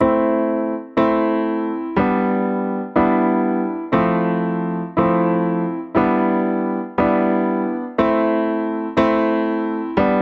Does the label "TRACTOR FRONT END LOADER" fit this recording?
No